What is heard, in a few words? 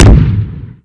c4 splode far